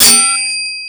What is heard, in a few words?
SW HIT, sword hits; Total Control - Action Cutz